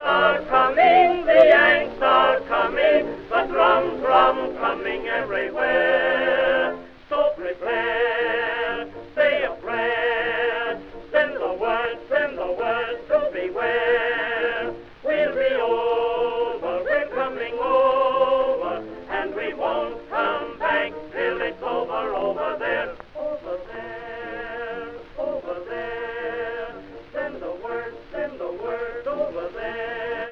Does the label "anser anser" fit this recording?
No